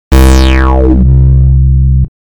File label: rez bass